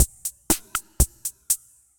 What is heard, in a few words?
Kit-D55, Effected Drum Kits; Drum Machines; Ableton; Factory Packs